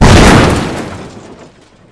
crash; Mafie 1

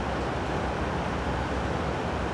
ambi town night; Mafie 1

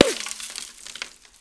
wall; Mafie 1

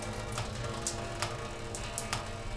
shower; Mafie 1

